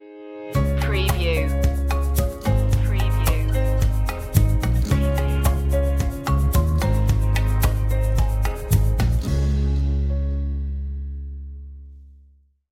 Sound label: sweet tune stinger